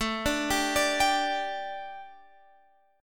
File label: A 7th Suspended 4th, chord